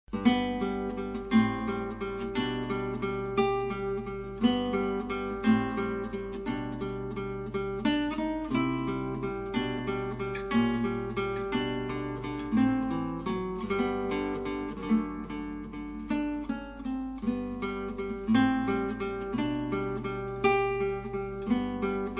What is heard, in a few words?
etude andantino con moto